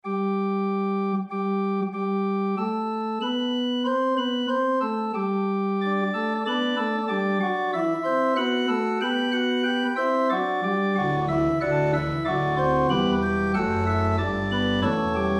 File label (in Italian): organo; Clasica